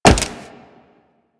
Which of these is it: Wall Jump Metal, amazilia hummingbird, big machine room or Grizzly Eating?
Wall Jump Metal